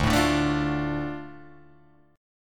D Minor D Guitar Open G

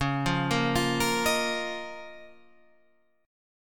D sharp 7th Suspended 2nd C sharp

